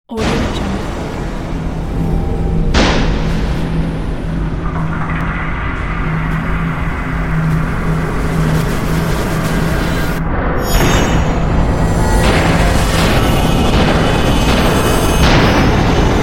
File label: trailer dark metallic impacts